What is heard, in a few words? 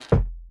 wooden chest close; factorio 2.0.55